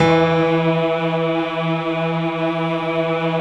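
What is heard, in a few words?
E 2, PIANO CHOIR; Best Service; Advanced Media Trax Modern Composer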